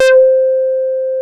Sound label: SQUARE, BASSES; Masterbits; Vintage Voltage